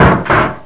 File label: rock; dosgames; brr